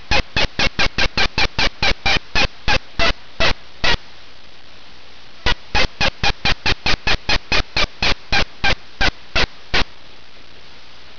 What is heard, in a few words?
mate cackle, turkey sounds